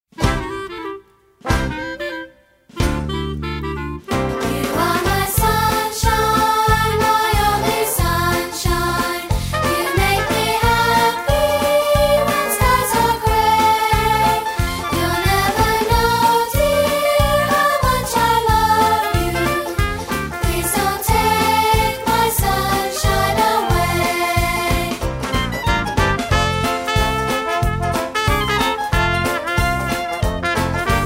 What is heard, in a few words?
sunshine vocal